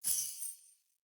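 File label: Staff Caster Projectile Destroy; Weapons; Staves; Starter Staff Caster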